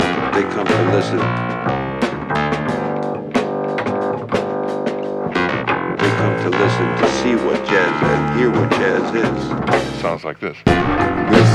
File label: jazz